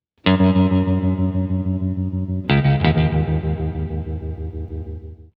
photo 70s, Strymon Flint, Guitars; Two Peaks.

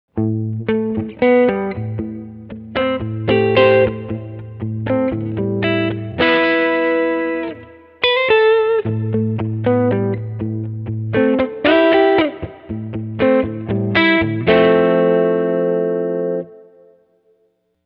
room; strymon big sky; reverb; Color Void

